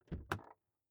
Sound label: car door open interior hummer h3